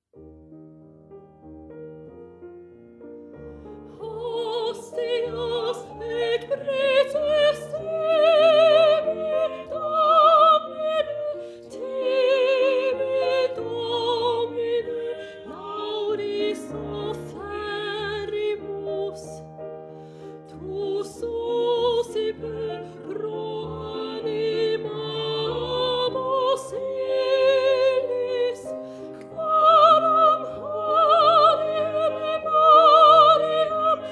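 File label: soprano; hostias; schola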